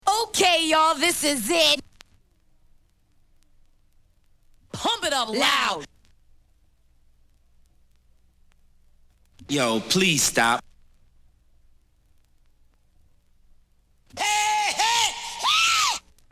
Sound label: samples scratches